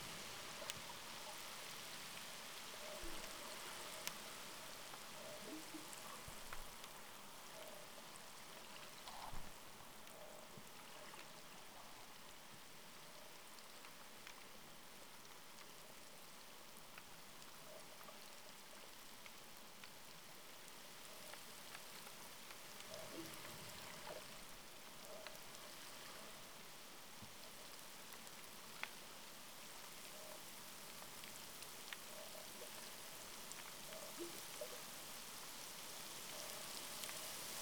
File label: Light Rain Example